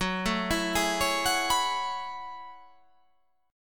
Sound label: F sharp Minor 11th, guitar